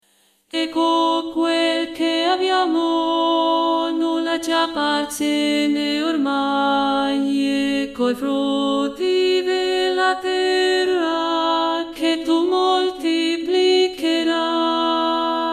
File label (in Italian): Ecco quel che abbiamo voce base; studio canti